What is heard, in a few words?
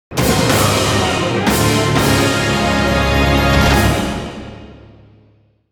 Break into Battle; Soulcalibur VI